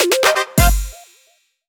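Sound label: Jingle Goal No Finish; clubhouse games 51 worldwide classics 2020 switch gamerip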